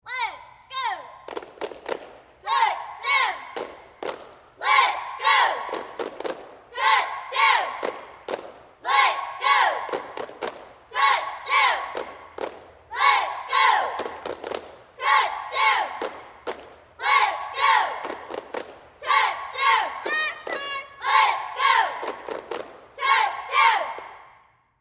Cheerleaders High, teen girls

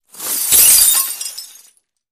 Glass Falls Medium; windows & mirrors

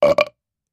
Belch Regular; belching

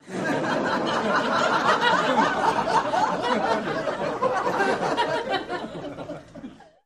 Laughter Lrg Crowd In

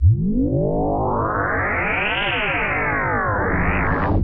pulse rise, drones tones

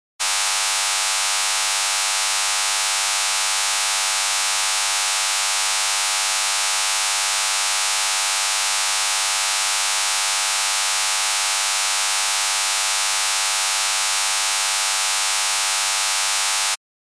Laser 4 Mediumpitche

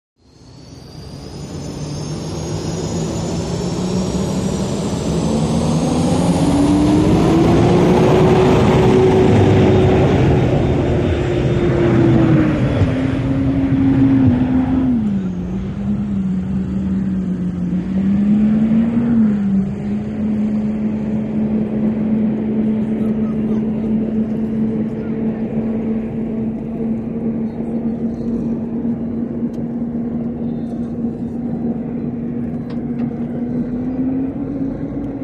Hydroplane Dock Amb

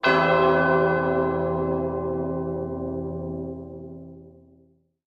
Music Effect Tube Be, chimes

